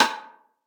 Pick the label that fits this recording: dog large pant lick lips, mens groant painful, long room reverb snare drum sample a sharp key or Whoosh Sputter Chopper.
long room reverb snare drum sample a sharp key